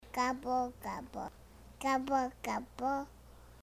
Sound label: Gobble Gobble